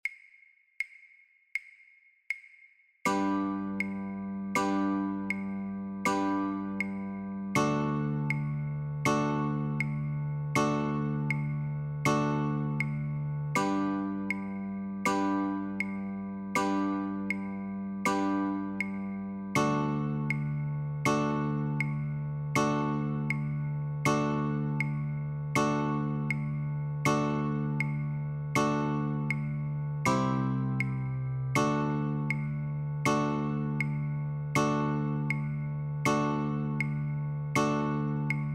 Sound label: Chords, guitar; christmas